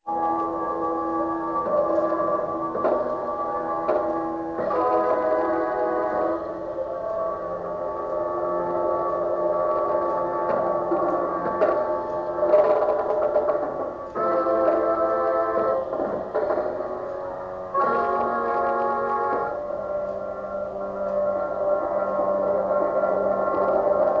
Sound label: circus chords